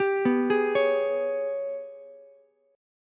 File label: G sharp Minor Major 11th G sharp, Ukulele C6; arpeggiated; JGuitar